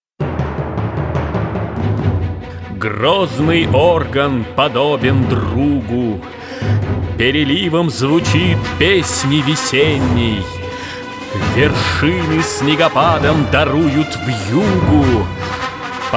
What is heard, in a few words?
groznyi organ